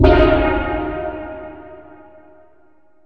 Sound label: crystal; weapons; dota; meepo